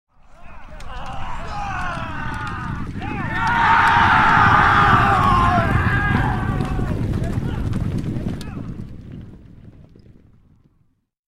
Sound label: 100 Horses Charge By With Yell; Historical; Hollywood Edge Historical Series; War Horses Armies & Weapons